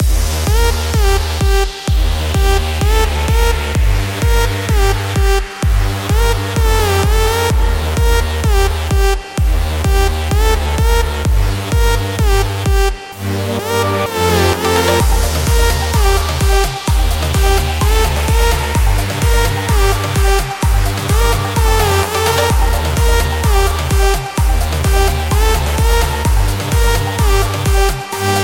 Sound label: Construction Kit Ds Loop Full